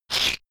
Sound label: shaker wood rough hit double soft